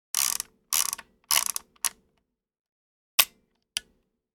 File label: Yunon Yn500 Camera Shutter